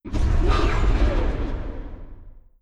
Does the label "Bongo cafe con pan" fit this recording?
No